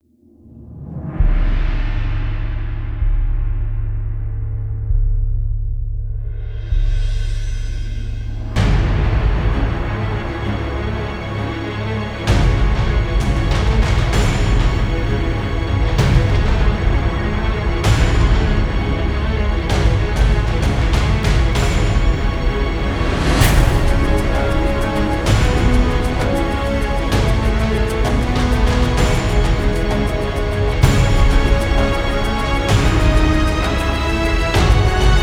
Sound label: (No Choir), Orchestra